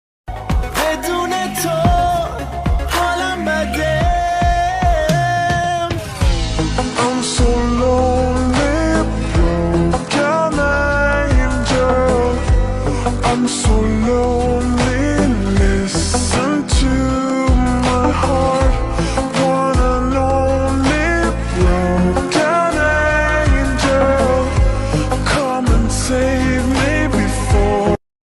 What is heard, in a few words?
slowed english ringtone